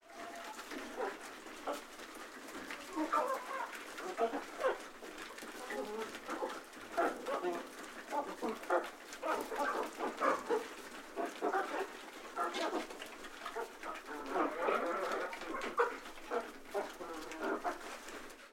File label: Chickens eating in roost